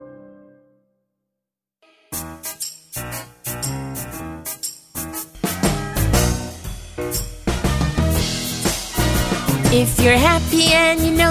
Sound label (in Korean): 영어 여 노래 아동; if you're happy